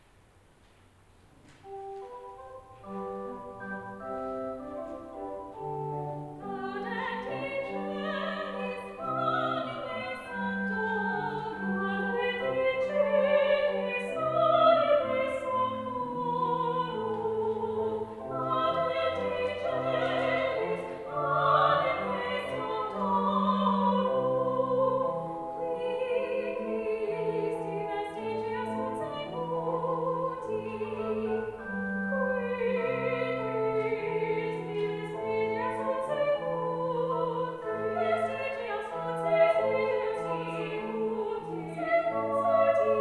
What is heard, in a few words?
Choral Evensong Introit Gaudent in coeli; Victoria